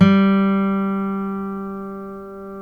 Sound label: STEEL G 3, FOLK STEEL Guitars; Spectrasonics; Hans Zimmer Guitars VOL-1